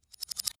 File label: thin metal vibrate reversed